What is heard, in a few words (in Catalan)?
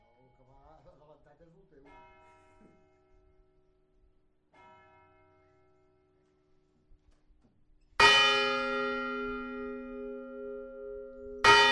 campana